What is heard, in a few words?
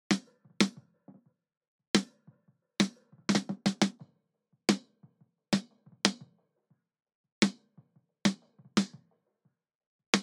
Snare really loud cymbals deBleed On